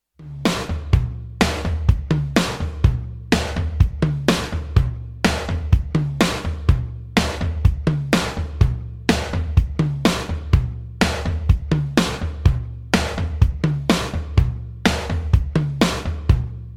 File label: karaoke, drums; Pattern karaoke